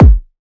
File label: Riddim Kick